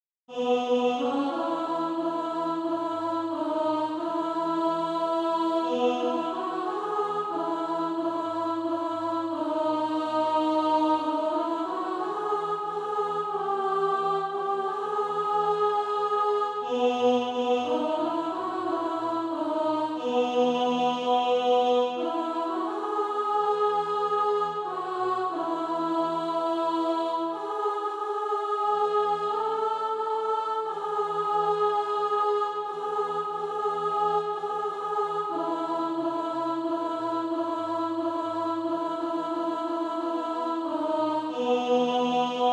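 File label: alto, Practise Music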